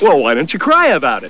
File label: cry